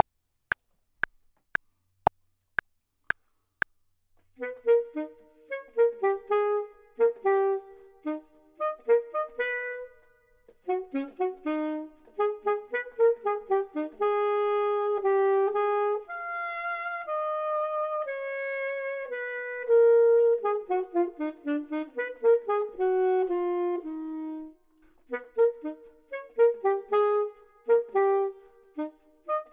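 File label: top, Saxophone and Clarinet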